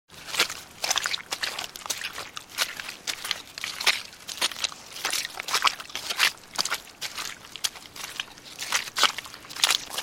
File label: Walking Through Mud, Footsteps walking through thick,squelchy mud.; Human